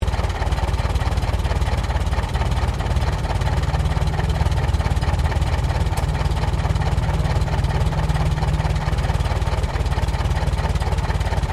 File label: Truck Chevrolet 1926 30cwt Idle Center Loop; Classic comercial vehicles; Trucks